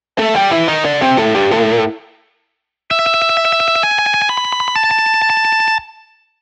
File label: guitar other